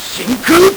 LSDj, Nintendo Gameboy; 300 Drum Machines

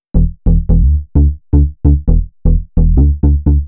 Bass, uk garage, 130bpm; Zona

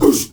MALE WAR, Heart of Africa, shout

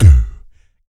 VOC PERC, Vocal Planet Jazz FX BASS PERC

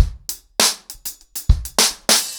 100BPM, ACOUSTIC DRUMS

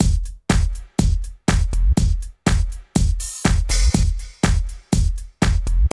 house loop

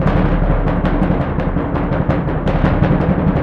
BS DRM; ILIO; Synclavier Percussion 2; World & Orchestral

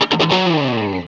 GTR, Dirty Efx; Club-50 - Foundations Roland

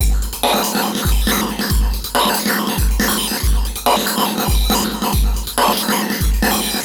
E Kit, Drums & Percussion, Electronica; Sonic Foundry (Sony Creative Software) - Electronic Point Blank

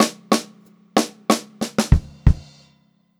92 ST FILL, Funk-Groove Drumloops; USB Soundscan vol.38; AKAI

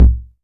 kick, Korg ER-1; Kit 18

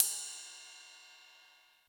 Ride, Yamaha RY20

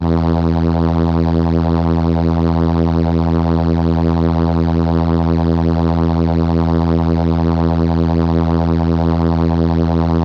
Trumpet E3, ARP Solina

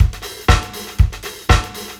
live beat techno; Sonic Foundry (Sony Creative Software); Ugly Remnants VOL-2

